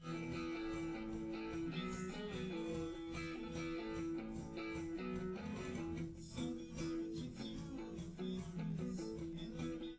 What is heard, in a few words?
wdrums liverec; underdetermined; SiSEC11